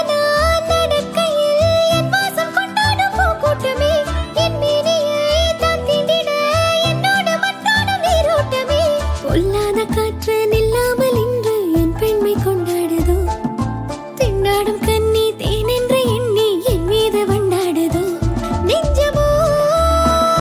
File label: tamil song ringtone